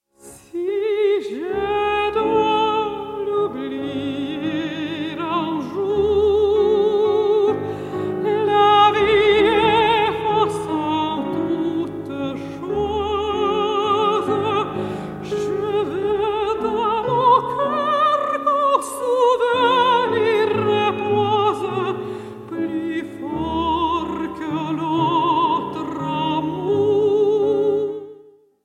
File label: valse chantee for voice and piano; francis poulenc; 1940